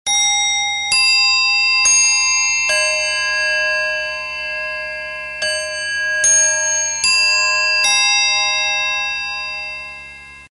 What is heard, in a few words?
Westminster Chimes